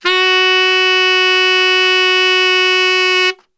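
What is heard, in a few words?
F# 2X SAX STR, saxophone; Best Service; Brass Super Section; SAX STRGHT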